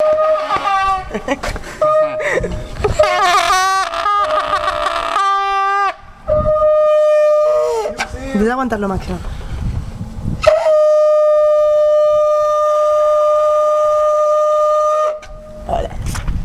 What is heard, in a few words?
Horns